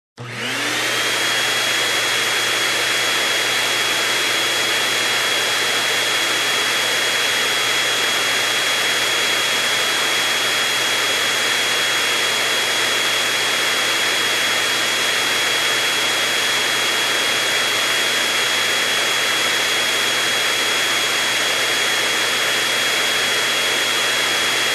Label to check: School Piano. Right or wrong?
Wrong